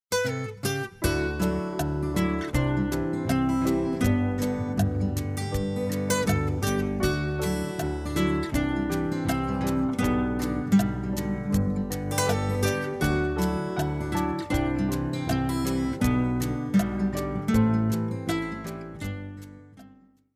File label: country